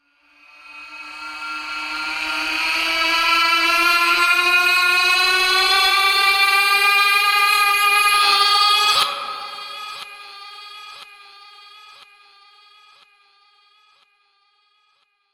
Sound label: Primal Scream, Male primal scream transition.; Screaming; Voice